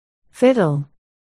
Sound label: fiddle